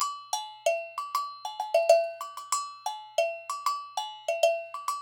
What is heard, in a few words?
NYC Percussionworks; Sampleheads; GJ LOOPS 96